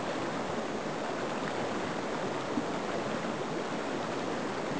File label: river